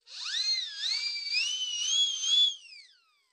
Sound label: Horns 3, Various Comic Horn Effects.